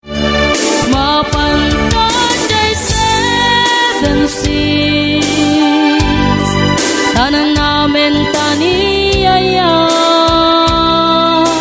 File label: ilocano, ringtone